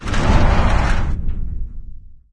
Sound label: heavy metal move, doors; Garry's Mod; hl2